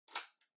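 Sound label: Portable Cassette Far Rewind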